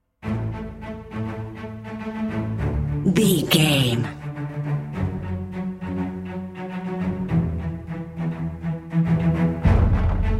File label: cinematic corporate dnb drum and bass mix